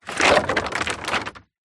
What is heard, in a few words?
foley bottle plastic full roll ground